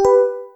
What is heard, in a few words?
listening; Linux